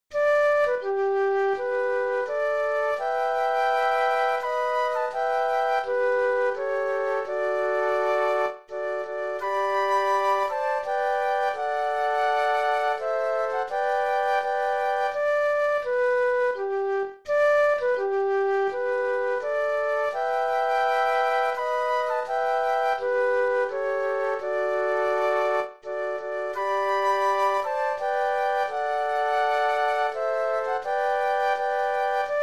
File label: flute trio; patriotic